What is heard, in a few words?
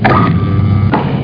Future Door; Future Door II